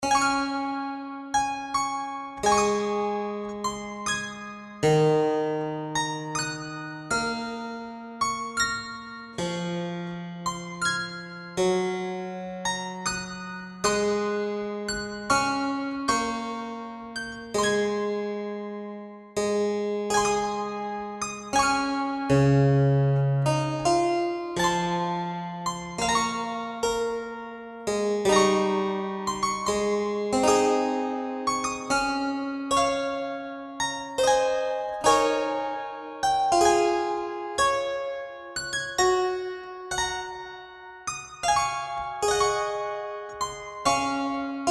English Harpsichord 4; Sarabande; Froberger

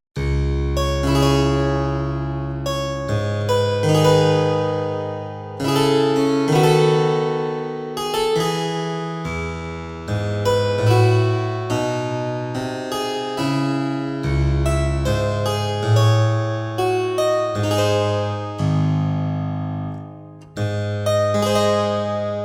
English Harpsichord O8; Sarabande; Froberger

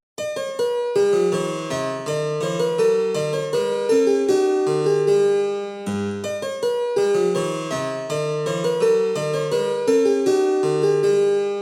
English spinet bouree

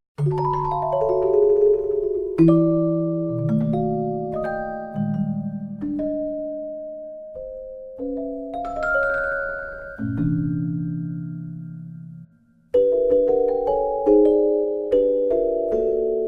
German celesta; Hommage an Schoenberg; Steffen Fahl

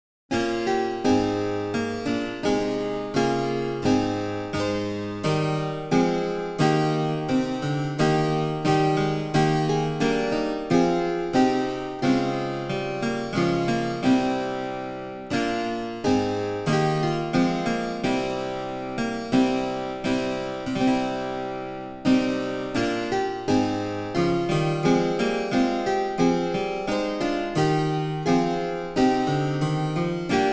German Harpsichord Front 8; German Harpsichord 1738; Front 8 Demo; Passametzo by Elias Nicolaus Ammerbach; edition germanisches nationalmuseum

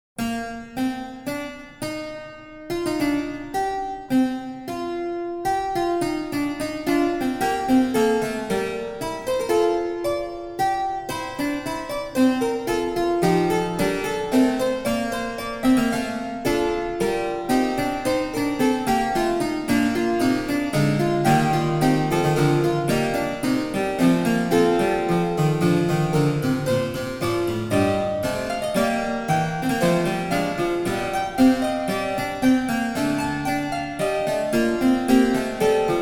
German Harpsichord, Fuge C; German Harpsichord 1738; Johann Sebastian Bach; Fuge C WTK; edition germanisches nationalmuseum

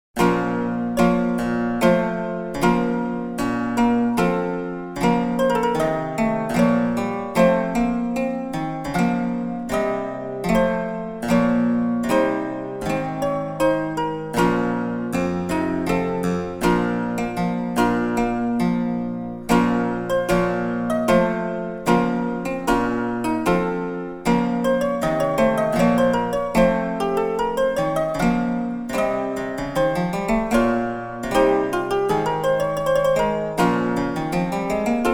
German Harpsichord, Lautenzug; German Harpsichord 1741; Lautenzug Demo; Excerpt from The Galliarde to the Firste Pavian by Willia; edition organeum

